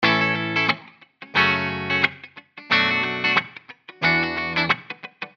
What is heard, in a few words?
90 A Electric